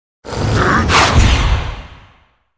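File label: bee attack, monster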